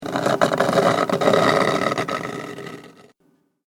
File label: Horror Scratch Wood; Wood Sound Effects